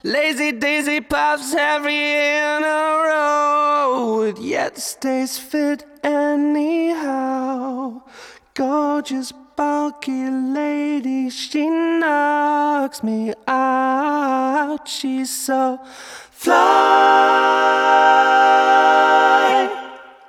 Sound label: Vocal Stem